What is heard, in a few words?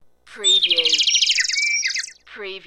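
Birds Chirp Short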